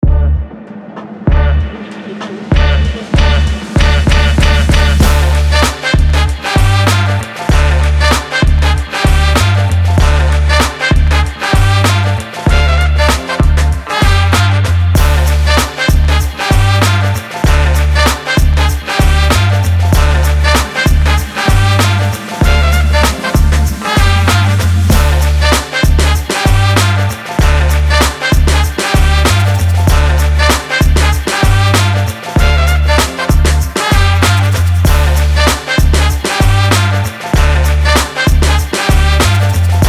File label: energetic hip hop Beats